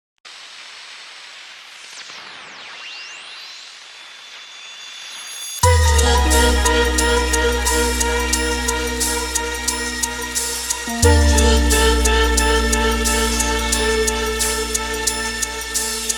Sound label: dnb